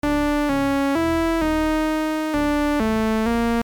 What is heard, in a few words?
BASS Warbass